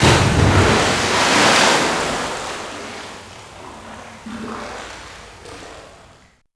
water huge head splash

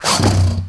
alligator attack

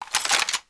bazooka reload

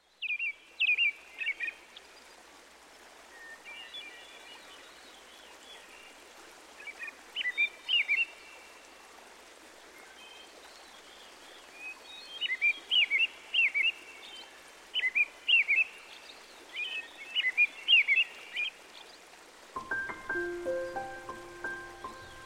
Sounds of Nature Forest Piano; NEW-AGE; meditations

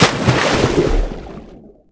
water bodysplash

